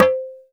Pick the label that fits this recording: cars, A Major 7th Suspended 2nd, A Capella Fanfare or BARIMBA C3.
BARIMBA C3